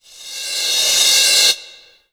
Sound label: Yamaha TG100 Rev Cymbl